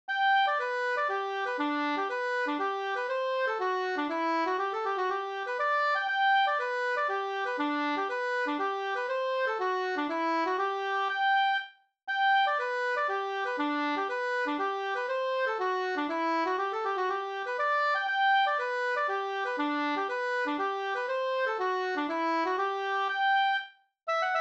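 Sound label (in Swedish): polska, tvåstämmig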